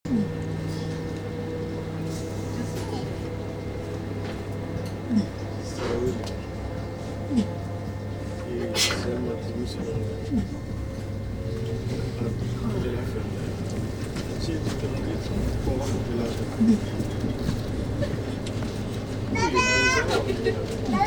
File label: istanbul ankara train soundscape